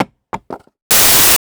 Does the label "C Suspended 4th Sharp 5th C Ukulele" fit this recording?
No